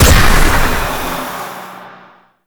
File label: Stun Burst; Warframe; Stalker